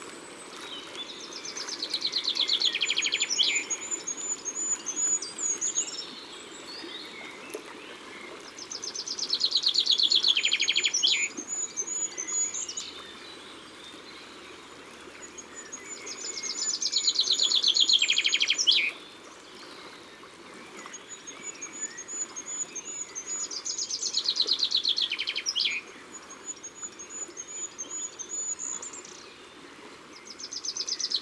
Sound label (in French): Pinson Des Arbres; Ardeche; Concerts Naturels De l'Ardeche